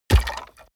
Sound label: coloss step on bones